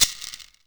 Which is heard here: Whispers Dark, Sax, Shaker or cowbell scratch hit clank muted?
Shaker